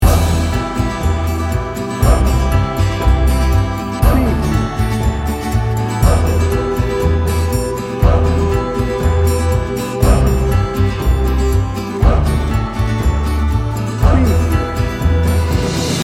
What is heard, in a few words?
spaghetti western no percussion